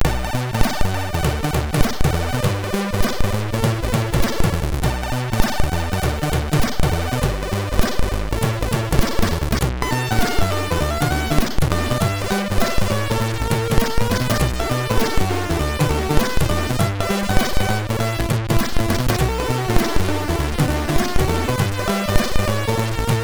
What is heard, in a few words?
Europop, sid MOS6581R3; Another Harmless Europop